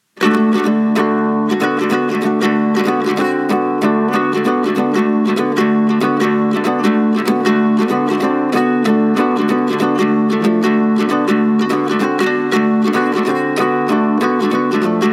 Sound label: ringtone country guitar; Mudita Pure